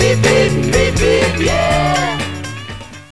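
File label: Beatle Beep Long with Band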